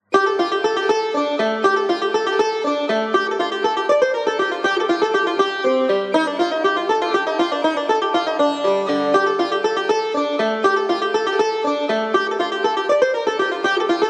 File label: study of dashti tar; first book